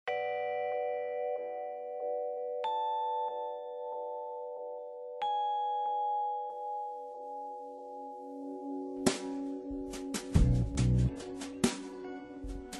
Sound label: song